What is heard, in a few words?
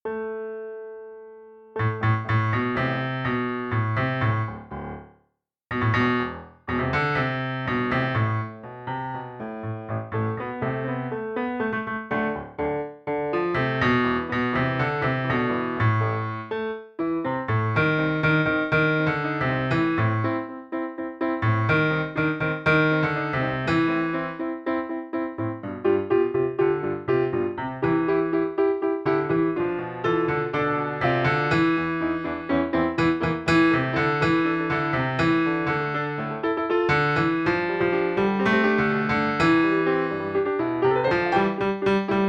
Melody; FOLK